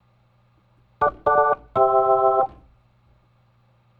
Organ, 70s Analog Funk

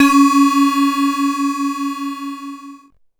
C#, BELL GONG, Synclav FM; Big Fish Audio

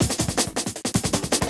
Beat, Industrial

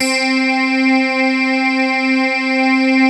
basses; Wizoo - Powered Wave

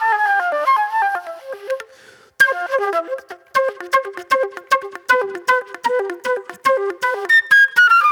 Old Soul Flute

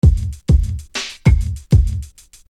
Beat, Hip-Hop Street Beats

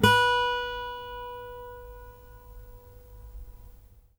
med pick, Guitar; Quantum Leap; Guitar & Bass

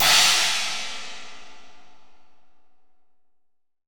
22 China, crash cymbal

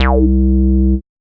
303 Bass, Old Skool House

